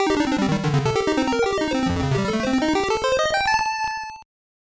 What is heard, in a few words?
Victory!; pokemon puzzle challenge